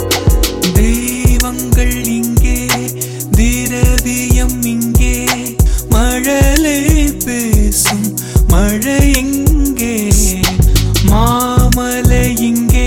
Ringtone

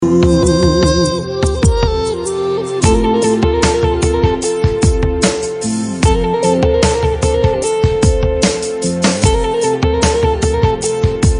Instrumental Ringtone

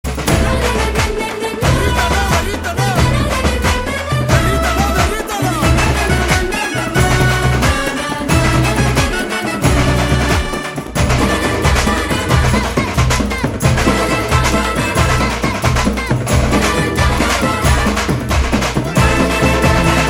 Love Bgm

